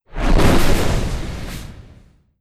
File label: fireball